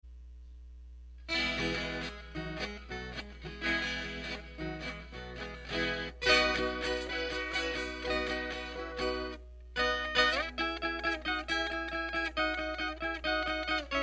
intrumental

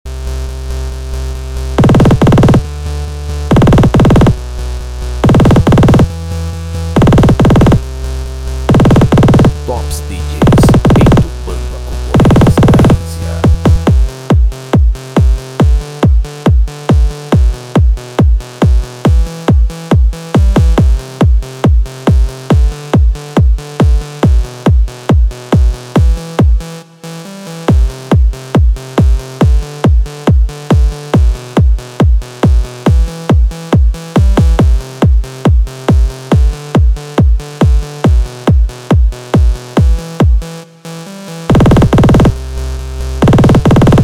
zumbido pancadão automotivo

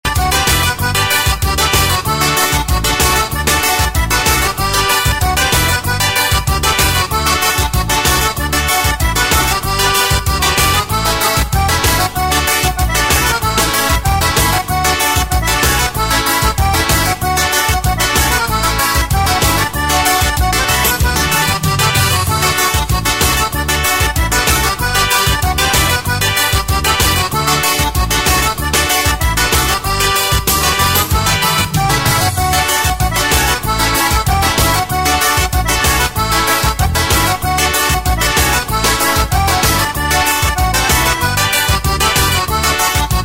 forro solado

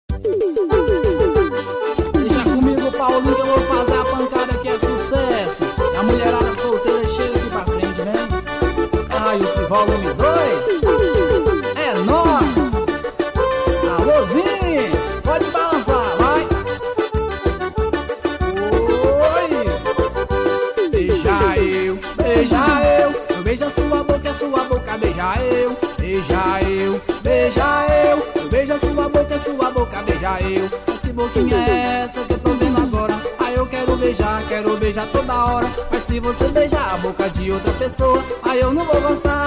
trilha

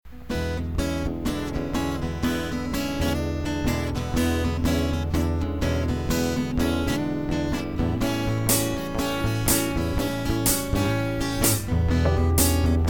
blues em mi improvisation

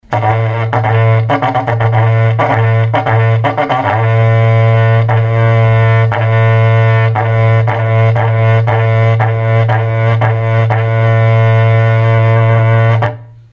berrante; berranteiro

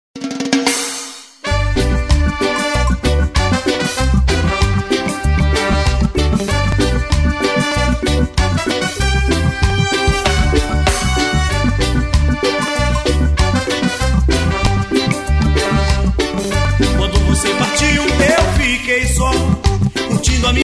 reggae

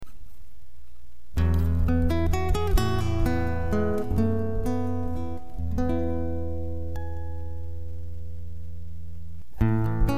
violao

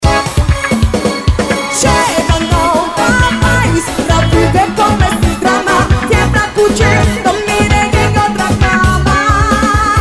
forro ao vivo

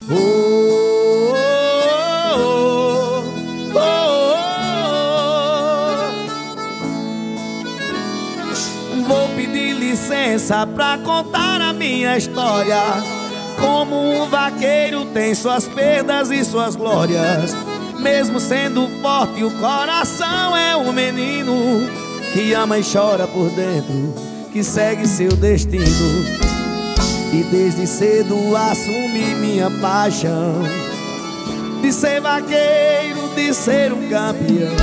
forro

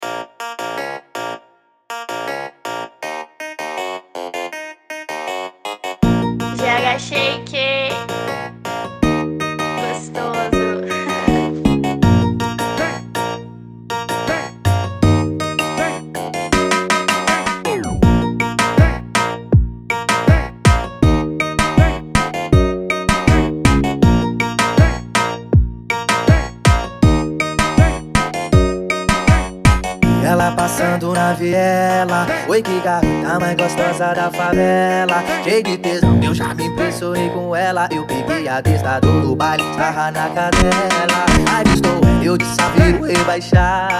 brega

